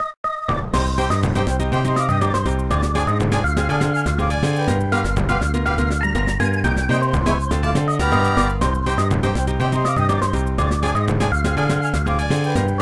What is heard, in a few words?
Music; Fury Volcano 2